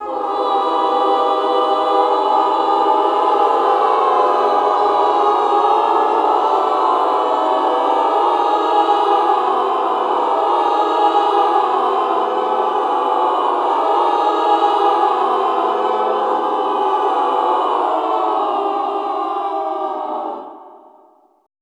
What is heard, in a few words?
classical choir, cluster wave; Best Service; Extended Classical Choir